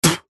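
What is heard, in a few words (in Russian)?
zvuki plevka cheloveka, human spitting sounds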